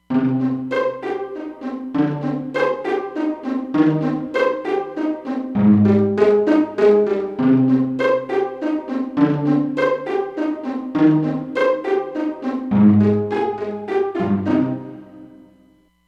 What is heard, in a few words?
Vls PizzHall, Roland Fantom XR